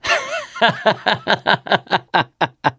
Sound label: laughter, mechanic, survivor; voice